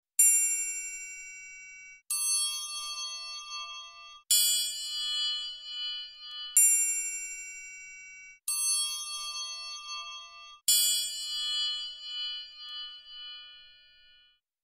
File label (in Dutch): Het geluid van de Triangel; Muziekreis